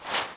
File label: wood draw